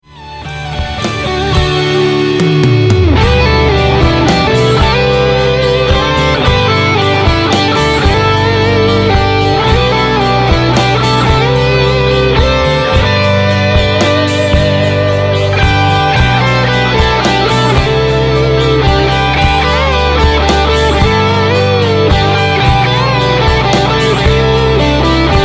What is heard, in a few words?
Waltz